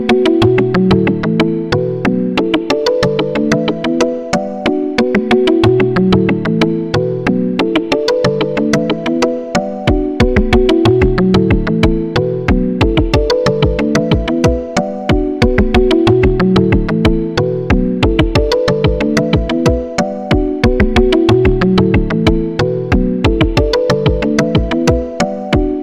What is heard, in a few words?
LG Ringtone